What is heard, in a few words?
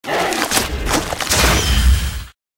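Lizard buff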